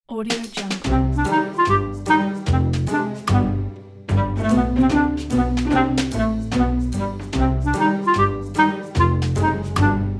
sneaky

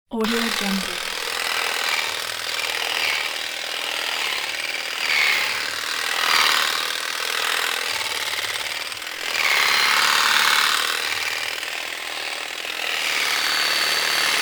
hedge trimmer

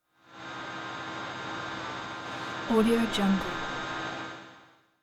spaceship sonar asynchronous transmissions

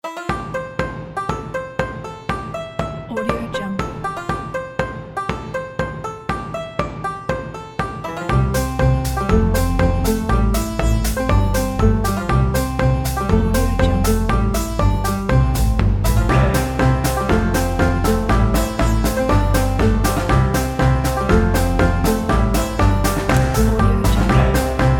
folk cheerful banjo

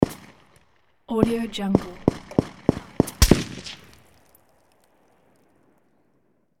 artillery war sequence modern gun fire; hollywoodedge

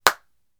hand clap single hits

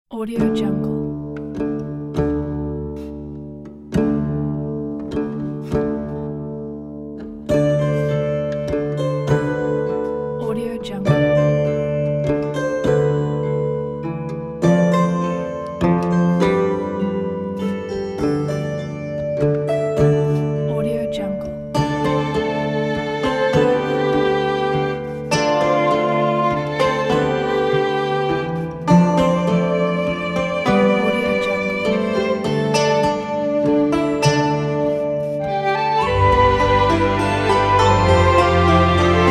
celtic fantasy dream

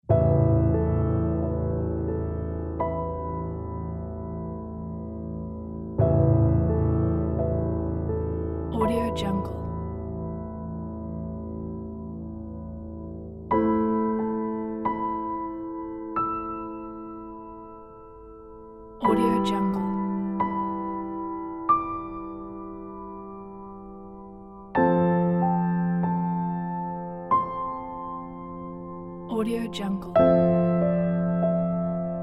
mysterious sorrowful documentary piano

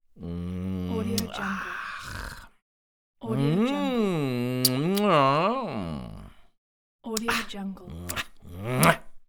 male saying satisfied mmm ahh; mehi production

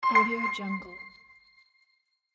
ethereal piano note ding

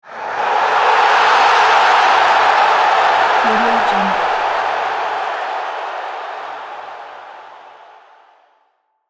soccer goal crowd reaction

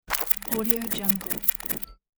weapon digital loading spin

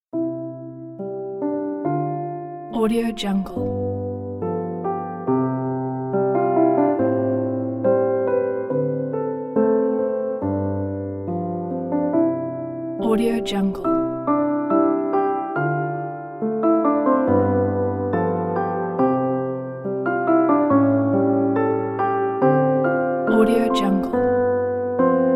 gentle emotional sentimental music

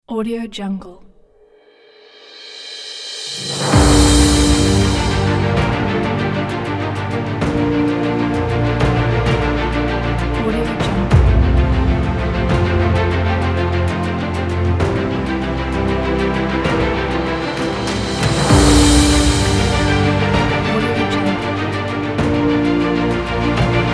epic trailer kit

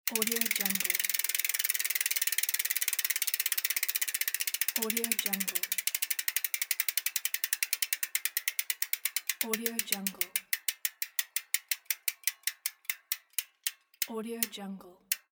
bicycle wheel

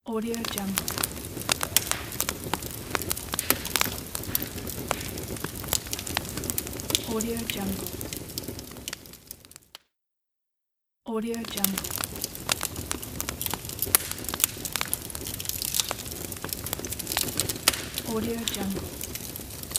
fire

